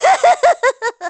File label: laugh; HammerBro